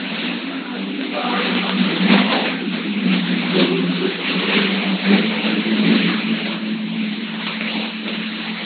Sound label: jingle